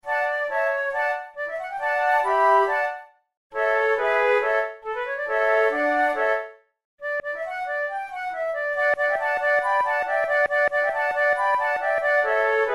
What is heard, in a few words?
flute trio allegro; quantz